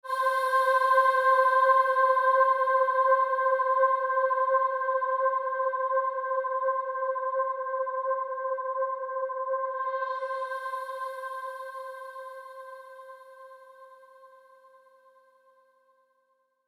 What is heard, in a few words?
Vocals One Shot